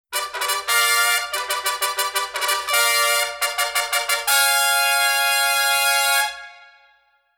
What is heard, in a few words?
Queen of Hearts Fanfare; alice